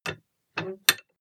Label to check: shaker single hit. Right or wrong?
Wrong